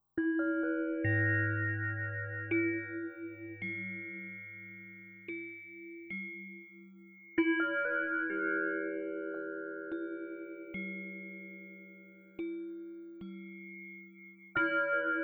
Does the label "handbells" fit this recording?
Yes